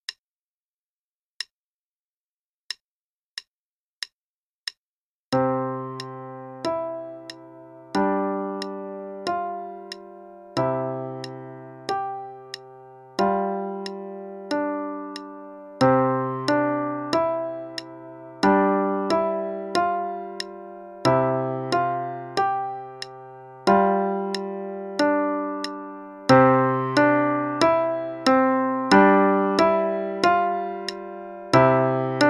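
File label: Bicycle Tune (quarter note=92); Level 1 - 2nd Ed; Faber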